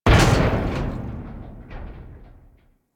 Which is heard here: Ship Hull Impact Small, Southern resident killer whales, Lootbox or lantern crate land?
Ship Hull Impact Small